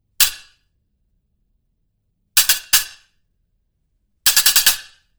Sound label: Stacker Pair, cymbal